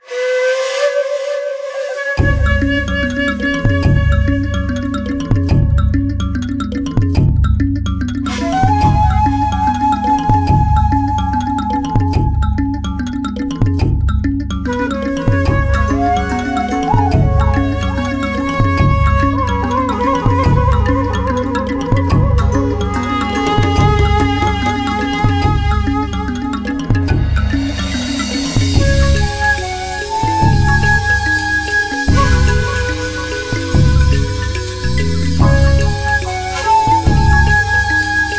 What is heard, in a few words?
rainforest; grotena; Manamon Music; Manamon 2